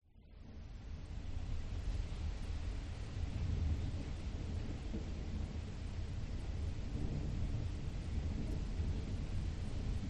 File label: nature thunderstorm distant internal home closed windows light rain occ cars pass; zapsplat